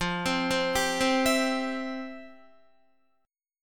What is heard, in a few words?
F Major 7th Suspended 2nd, Guitar; arpeggiated; chordshape; 12,15,12,12,12,12